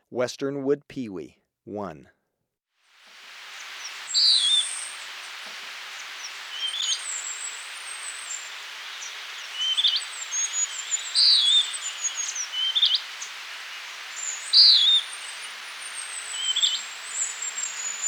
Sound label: Western Wood-Pewee, Birds of Ecuador; S. America